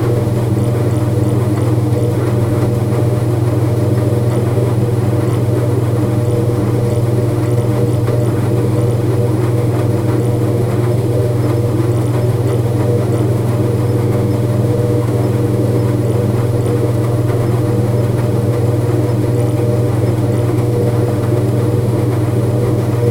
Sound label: studio quality sound of a, electronics